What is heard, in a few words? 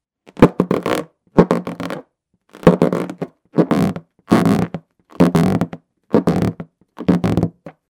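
balloon sound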